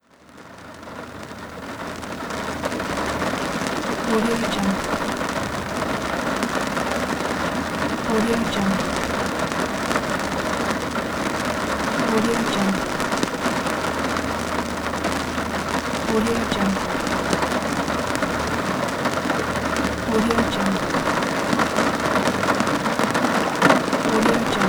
rain on car windshield

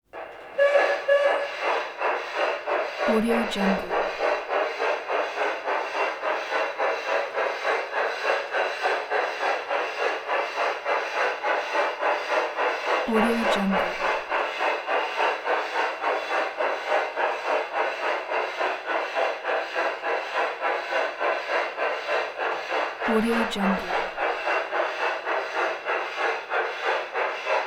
toy train electric tooting whistle blows

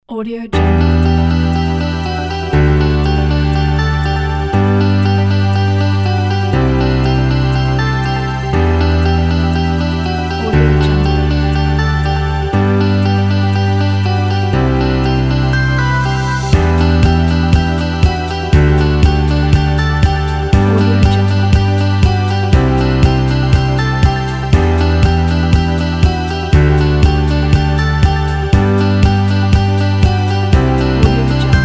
energetic corporate pop

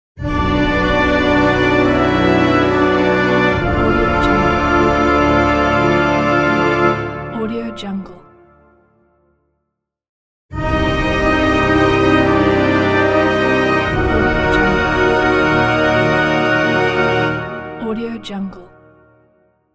villain appears

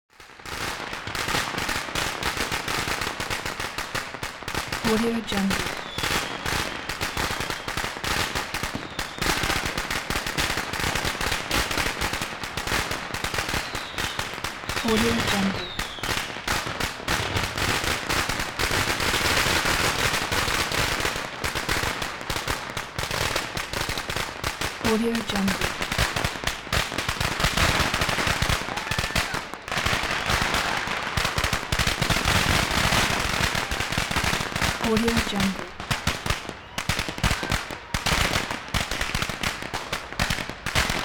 fire crackers exploding with reverberations

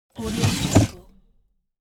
desk drawer; wistansound

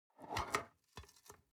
object metal shell storage lid slide open soft; smartsoundfx

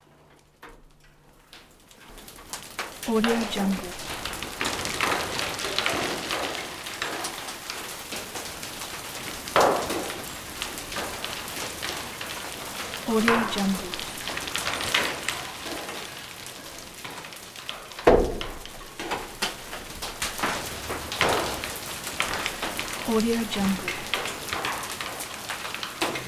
rocks tumble constant

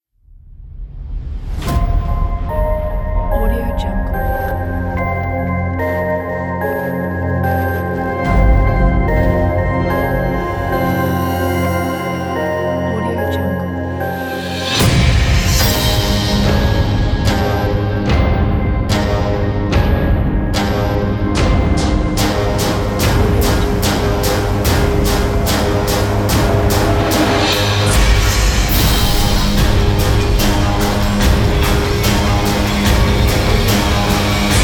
action trailer

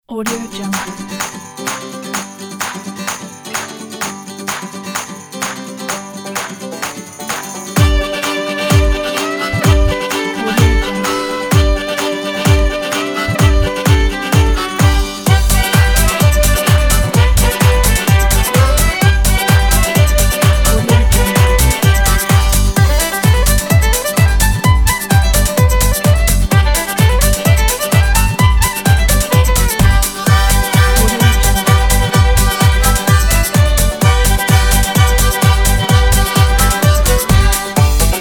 happy cowboys

